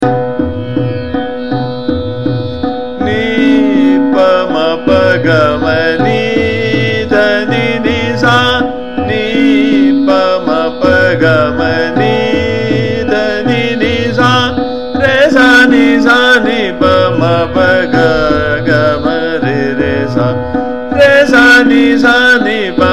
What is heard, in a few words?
Sargam Ni Pa Ma Pa Ga Ma Trital, Bahaar; tanarang